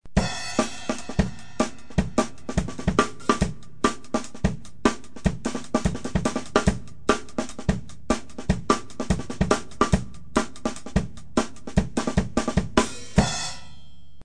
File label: breakbeat